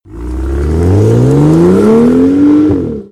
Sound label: car